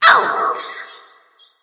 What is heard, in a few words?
grunt hit light; smite; voicepack; hel